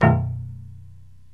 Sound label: PITZ PIANO